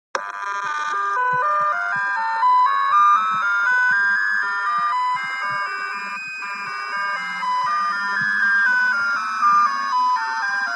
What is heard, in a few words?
Matrix Like